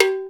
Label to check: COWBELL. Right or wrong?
Right